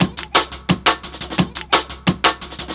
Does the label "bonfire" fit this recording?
No